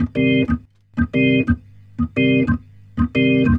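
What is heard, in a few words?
organ, Roots Reggae; B-3; Sounds Good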